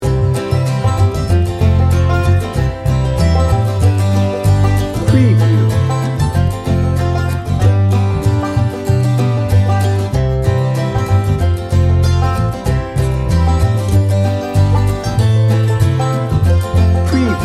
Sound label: old time country folk loop